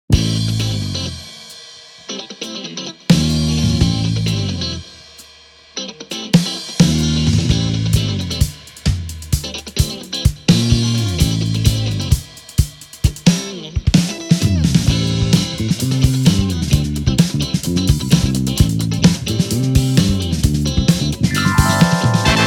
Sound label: Wild siren funk remix; dragon poker